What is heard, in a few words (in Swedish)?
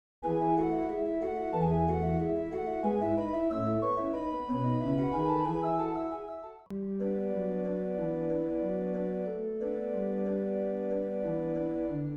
Springtime-Vårsvit för orgel